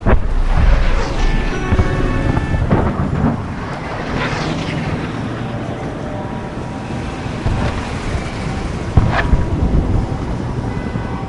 Amb Tokyo Loop; Godzilla Smash3

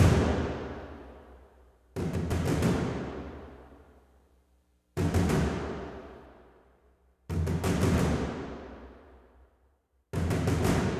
Drums Track; assassins creed ii

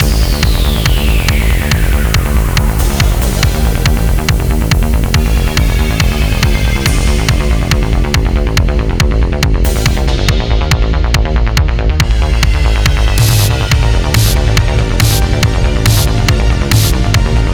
Highway Loop Full

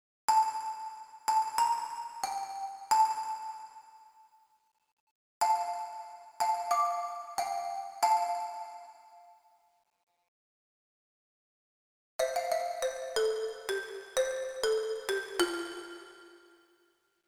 Old Xylo; Tracks Toybox Edition

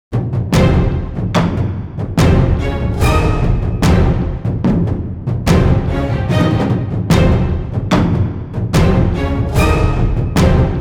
epic music; finaldoor; Melissa K. and the Heart of Gold

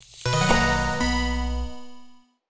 Victory Sound; Blackjack Math

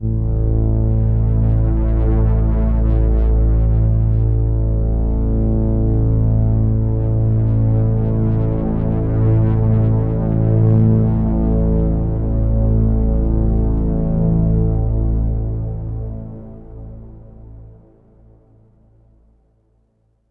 Overlay Drone; Act3 TheView

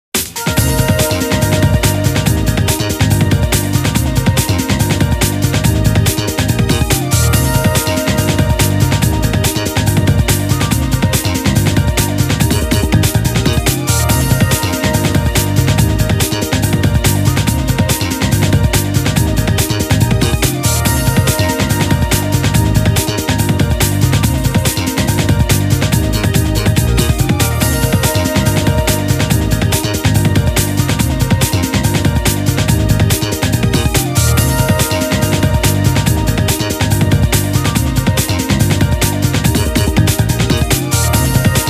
Music Menu event

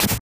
hit; Bishoujo Senshi Sailor Moon R; snes

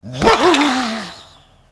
Ghost Attack

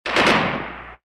Shoot Submachine; blockman go; android

